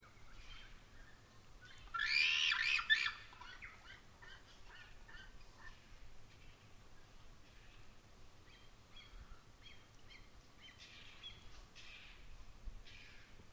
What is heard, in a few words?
pied-shag